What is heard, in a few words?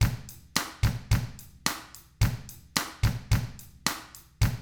109 POP; ILIO; Double Platinum Drums 1